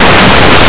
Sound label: box; Blue Heat